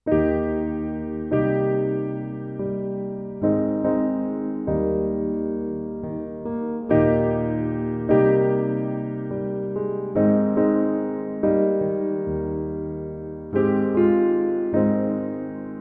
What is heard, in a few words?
Remembrance B (no cello)